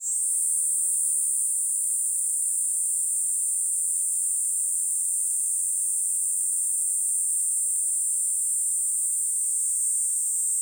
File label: platypleura kaempferi cry, animal